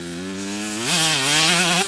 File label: Low, Engine